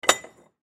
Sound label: Tool Set Down Metal; Hand Tools; INDUSTRY; Sound Effects:Tools; Blastwave